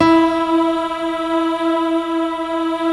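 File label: E 3, PIANO CHOIR; Best Service; Advanced Media Trax Modern Composer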